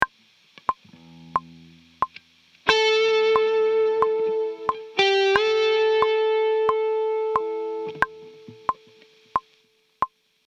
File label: Lick